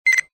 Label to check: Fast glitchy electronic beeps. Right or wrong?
Right